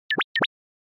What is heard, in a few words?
Motion Pop